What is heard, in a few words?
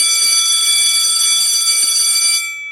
School Bell Message Ringtone; ringtones